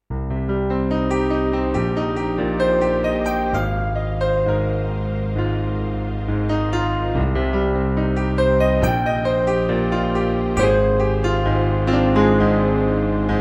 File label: MIDI Grand